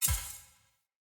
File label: Melee Hit Critical; Weapons; Warnings